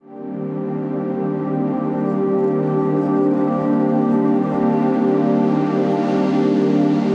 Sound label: Moody Pad - Gmin 130 bpm; MIDI Clips; Tonal; Atmospheric; Ableton; Factory Packs; Mood Reel